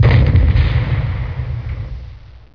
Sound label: boom